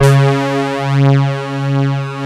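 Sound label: OBX PAD C5, Oberheim; USB Soundscan vol.49 - Analog Fantasies; AKAI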